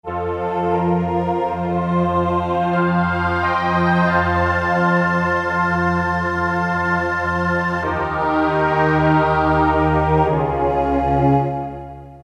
VOX harmonium; Roland PN-D50-01

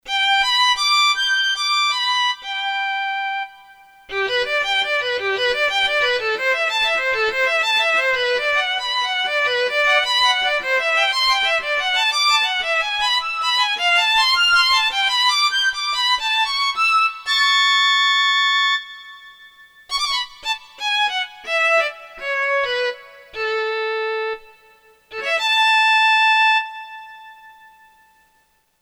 Violin; Korg DSS-1 Sound Library

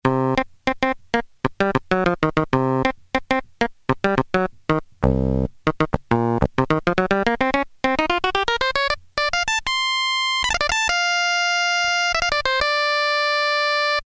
synthe chopper, Technics SX-K450

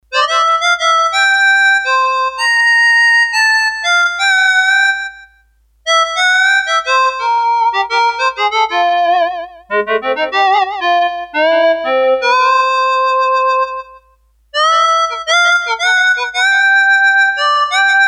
Reed, Korg DS-8; Factory Patches

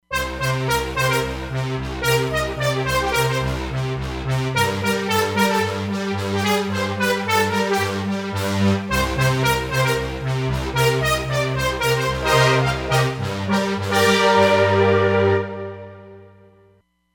JP8000 Brass, Roland Fantom XR

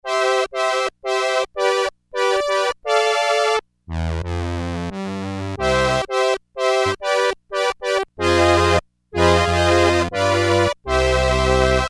Synth Brass, Crumar Trilogy

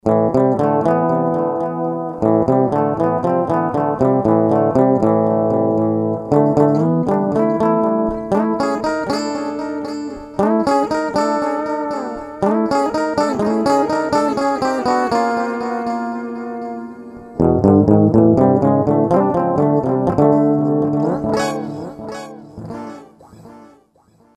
CLEAN 5THS; Digitech RP200; Factory Presets

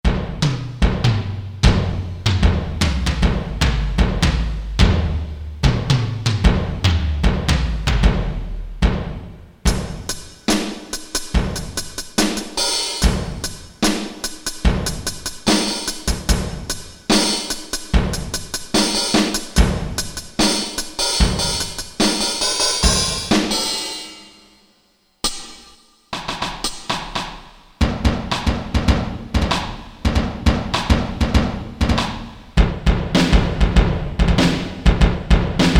AMBDRUMS, Ambient Drums, Korg DSS-1

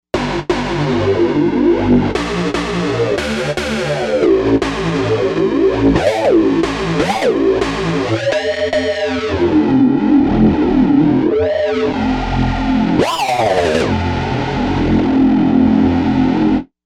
Bass, Novation UltraNova; Factory Patches; Quily Bass

